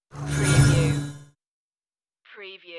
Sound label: starship door access